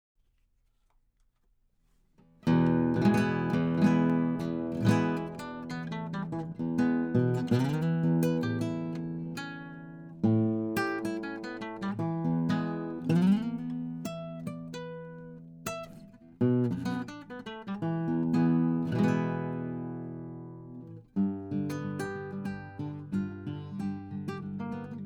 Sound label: Martin, Guitar